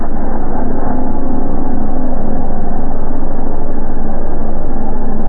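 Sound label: inside lighthouse ambience; Source Engine; cstrike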